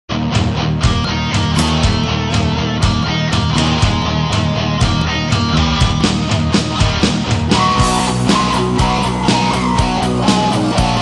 rock Guitar

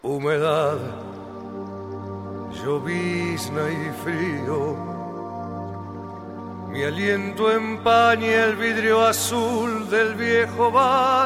Tango

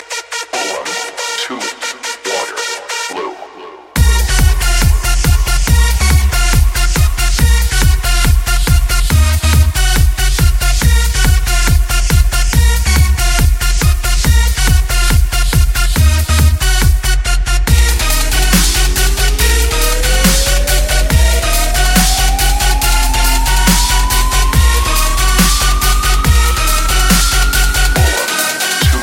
waterflow bass

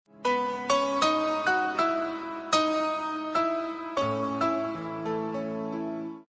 nice tone; rnb

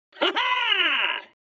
Hahaaa

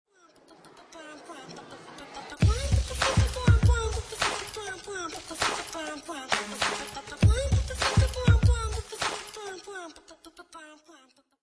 beat box

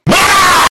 RAWWR

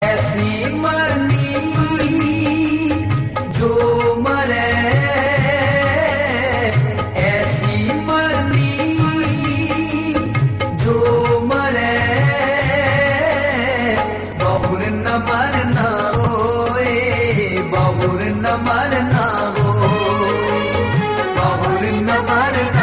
punjabi gurubani

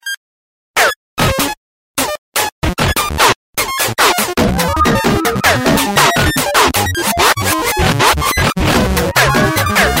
cacaphonic ringtone

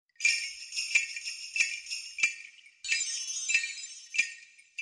Cow Bell

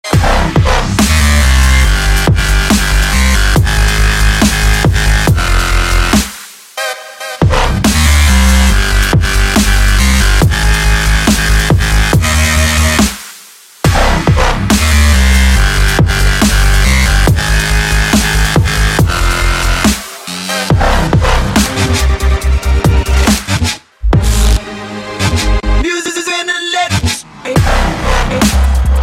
dubstep; electronic

